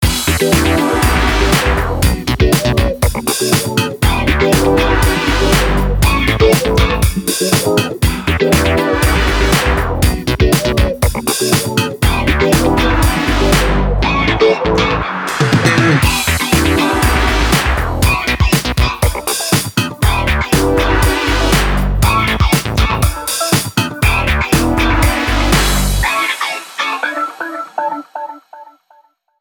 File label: swinging funk